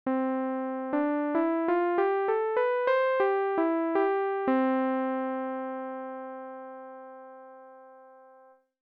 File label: do re mi